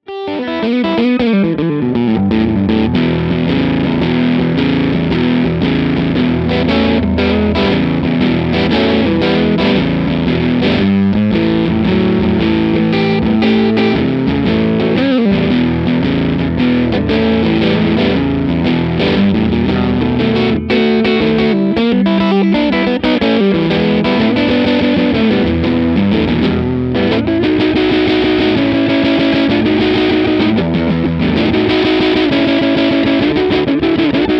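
TC15 Channel 2 Lo Munch Hi PRS Mira Humbucker Neck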